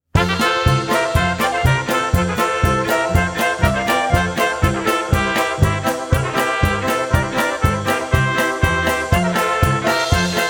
Gray Horse Polka

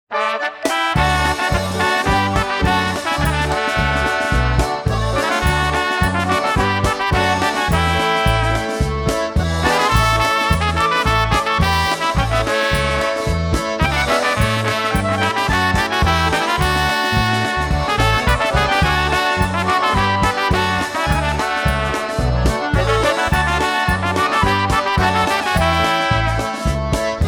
Polka

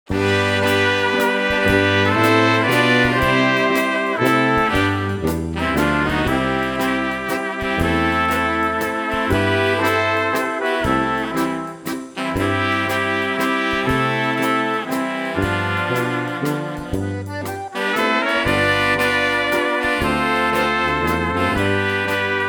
Waltz Medley

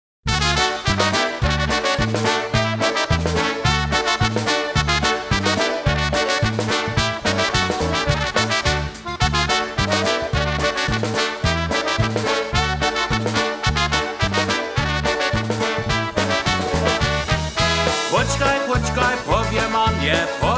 Polka

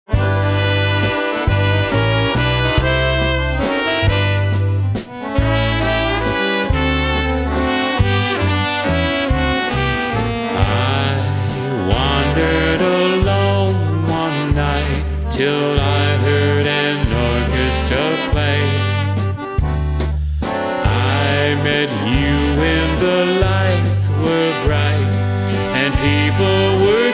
Waltz

